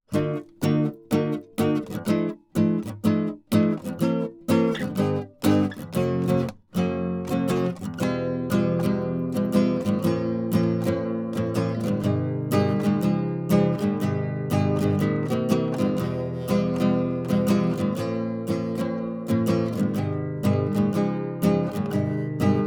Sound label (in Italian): TEST chitarra Rode; Apogee